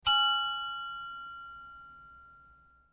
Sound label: ding